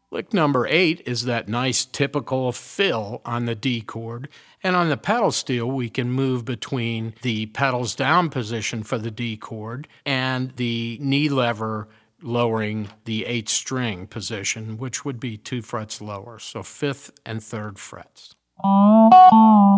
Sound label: resonator pedal, steel guitar